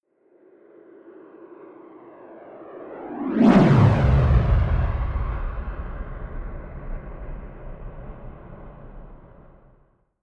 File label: rocket sidewinder flyby whoosh fast left to right close